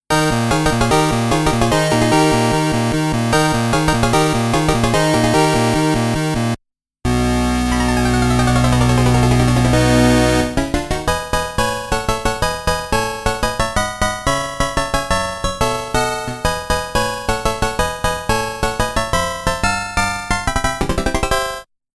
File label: Boss Clear; Exed Exes; Capcom Music Collection Vol.1